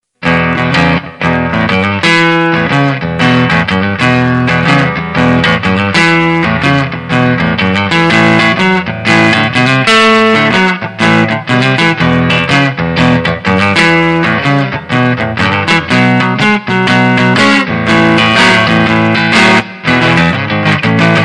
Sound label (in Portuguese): Guitarra